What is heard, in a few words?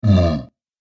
Giant Ogre Stupid, monster; Monster Sound Effects; horror sound effects